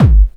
techno 2, bassdrum

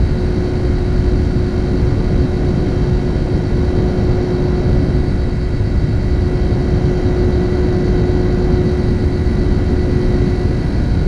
BOILER, AMB; ENVIRN-AMB

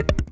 bass fx, drum & bass, 150 BPM; Ueberschall; Drum & Bass Resonance

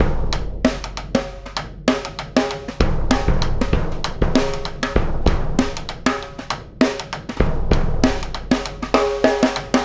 Big Drum and Sidestick; Sonic Foundry (Sony Creative Software); Essential Percussion; Drums; Big Drum Combos

